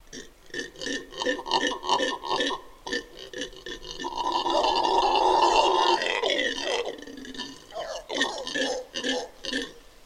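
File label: Monkeys Howling Snort, howlers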